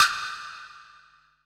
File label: METAL; BPC PERC